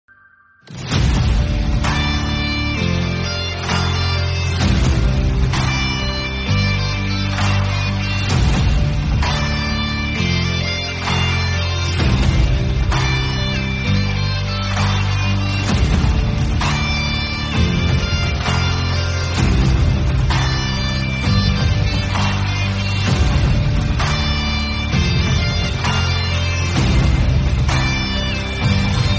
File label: bgm ringtone